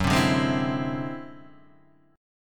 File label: D 9th Flat 5th F sharp